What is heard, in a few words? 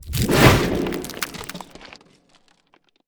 Ice Spell Whoosh, Cold Whooshes; Arctic Aura; Gale